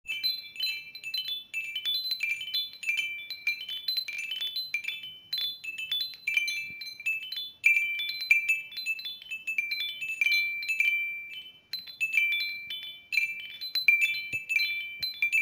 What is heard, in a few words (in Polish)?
dzwonek malutki, fengshui; dzwonki